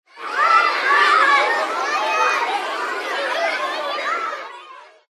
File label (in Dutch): Spelende kinderen; ZhenShiXunYouJi2